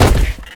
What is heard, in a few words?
plywood hard land; Original TK Sounds